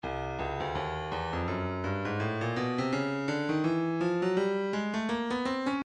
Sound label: breakbeat 083 bpm; Oppressor A; Stylus Breakbeat Midi Files